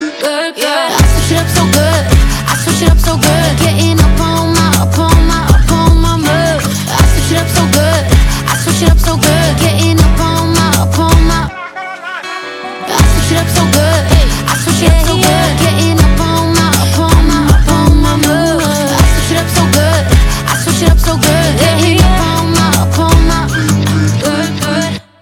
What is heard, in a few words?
acoustic